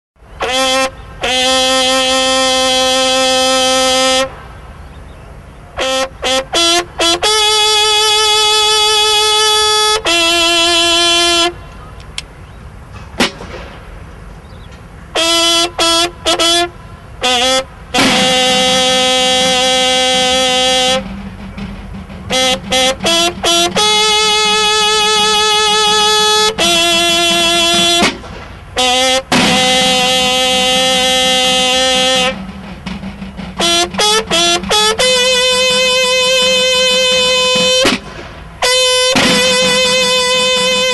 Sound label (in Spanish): Toque Silencio, TOQUES MILITARES; MATERIAL CÍVICO